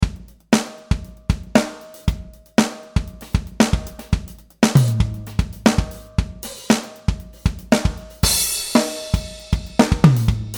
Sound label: Funk DW Maple; BFD Factory